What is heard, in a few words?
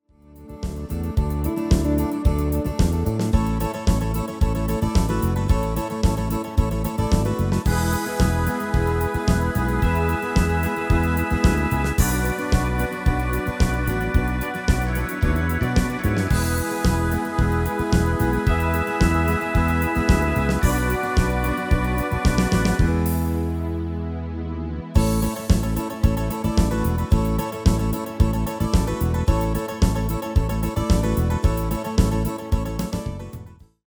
MIDI